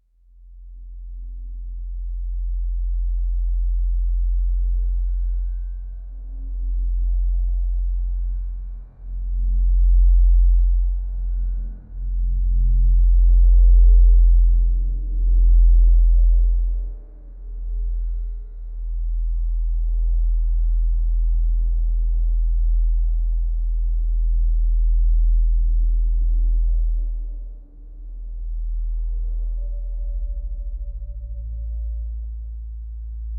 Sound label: relaxing low frequency co, cosmic